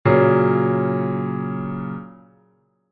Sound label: F♯, Chord Inversions